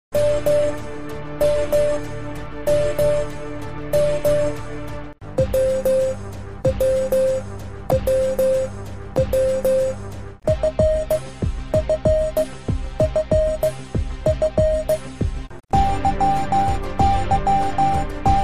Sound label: Morse Song Sequence 3-M-W-F-Y-P-G-B-V